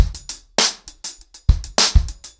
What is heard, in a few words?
100BPM, ACOUSTIC DRUMS